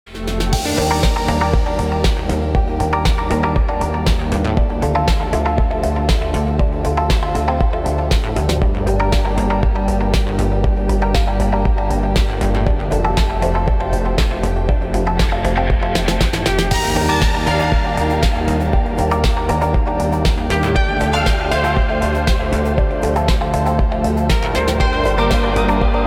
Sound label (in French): bingo musique; Nuna'a Heritage